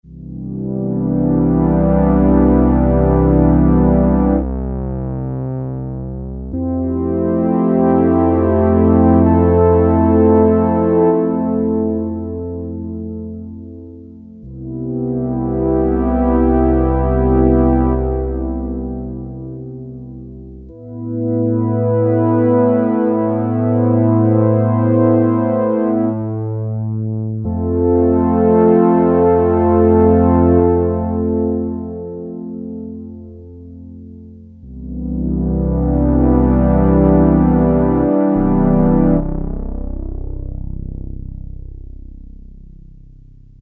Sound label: Pad 2 - Filtered Sawtooth, long envelope, Crumar Trilogy